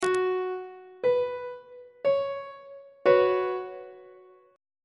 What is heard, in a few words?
g flat suspended 4th chord on treble clef